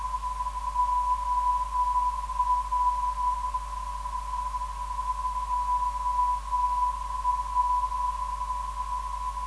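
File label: non-directional beacon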